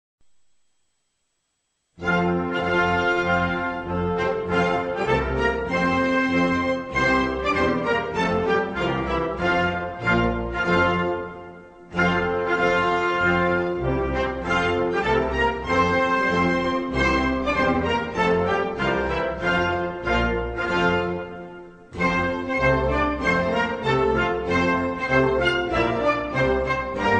Himno nacional de Arabia Saudí

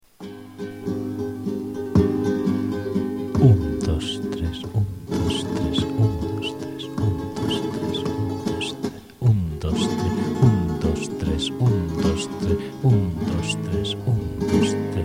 fandango

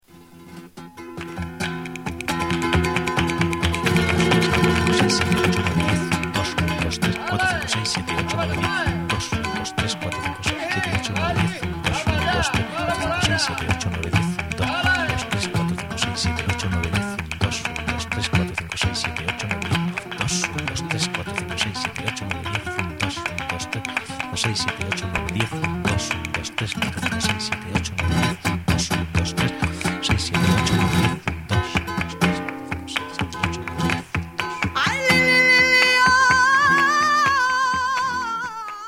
buleria

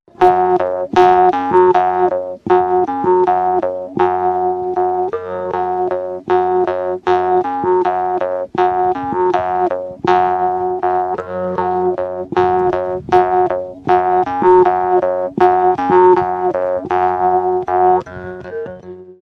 trompa; wichi

